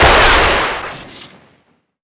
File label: revolver shoot crit; weapons; tf2classic